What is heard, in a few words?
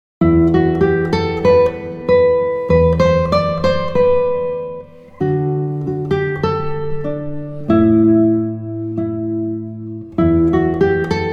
Jacobs Ladder, guitar; Play Guitar Powersteps 1